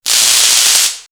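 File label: Electri City, electronic fx; X-Static Goldmine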